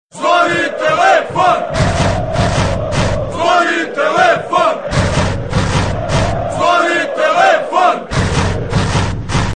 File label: Zvoni Telefon